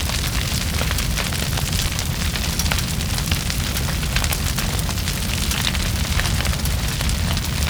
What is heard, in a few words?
Amb Destruction; Red Orchestra Ostfront 41-45